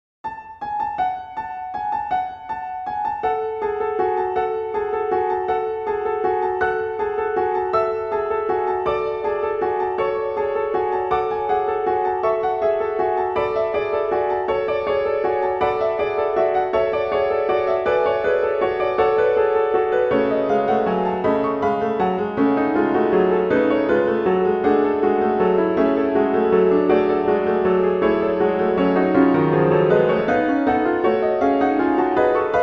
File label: bells, Piano; Carol of the Bells; Holiday; arrangements